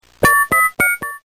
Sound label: Touch Tones